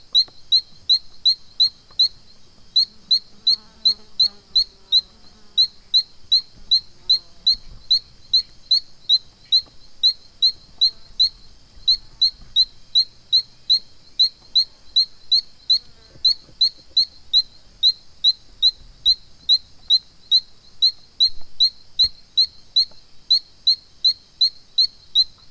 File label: Ameerega berohoka